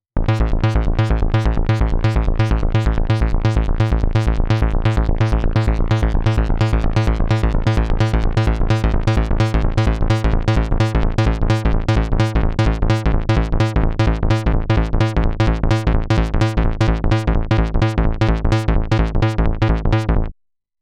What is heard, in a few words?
swing, Novation Bass Station 2